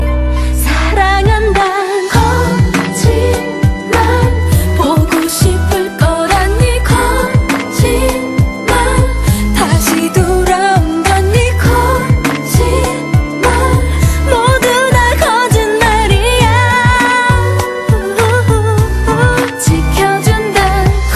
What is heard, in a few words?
ballad version